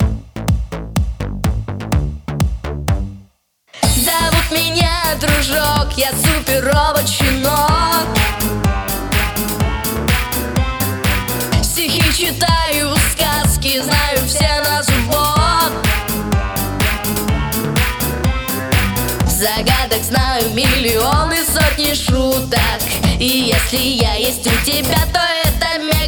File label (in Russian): Robot shhenok Vanya vokal